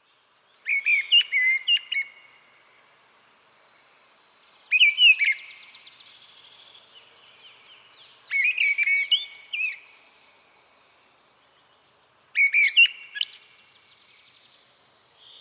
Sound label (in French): grive draine chant